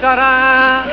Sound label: Tada; Laugh-In